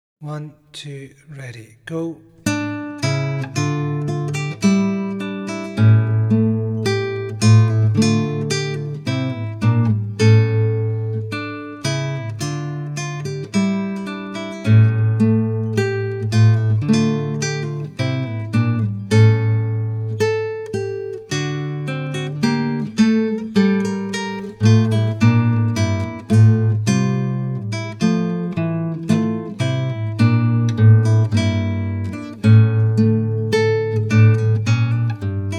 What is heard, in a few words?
Gavotte